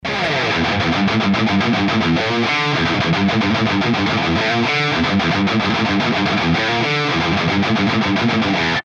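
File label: Bogner Uberschall; NUX MG-300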